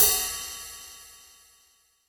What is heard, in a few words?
big room ride cymbal drum sample e key, Royality free ride sample tuned to the E note